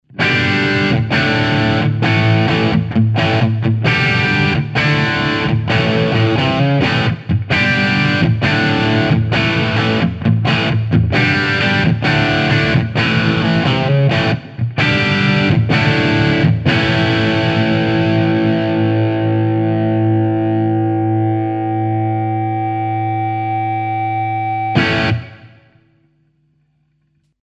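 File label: Humb 59 bridge JTM45 BB preamp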